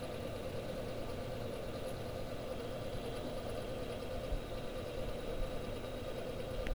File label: Fan Rattling; SFX; IRL Recorded